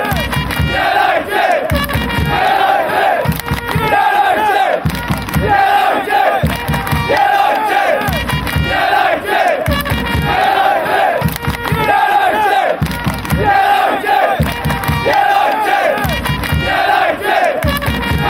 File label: united claps, fan chants